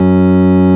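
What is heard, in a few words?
organ F#2, Farfisa Combo; Keyboards of The 60's and 70's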